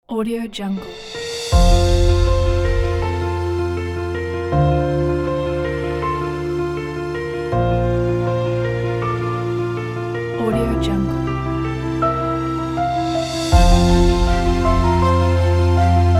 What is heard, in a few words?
inspired piano